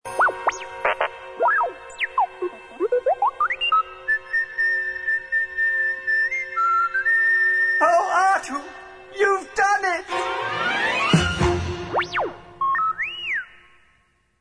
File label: jingle bells